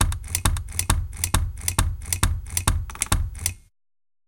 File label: 135 Bpm Loop